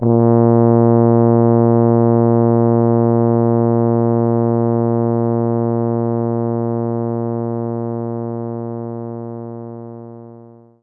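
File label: Tuba A#1; knights try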